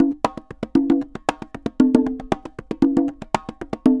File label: CONGAS, SALSA; World Grooves; Best Service ProSamples vol.14; AKAI